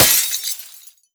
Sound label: break glass vase; physics; breaking